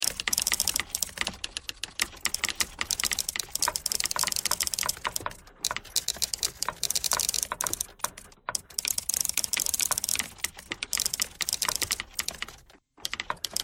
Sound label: typewriter thread all